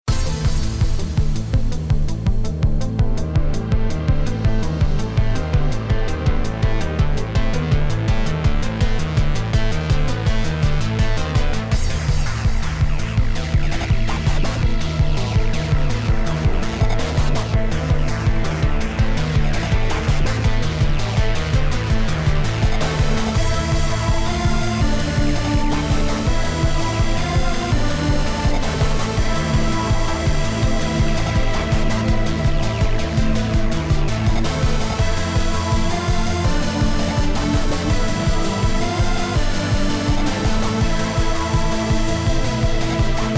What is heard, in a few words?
Boss Loop; ICEY